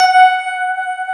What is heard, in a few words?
SYN K-2000, Roland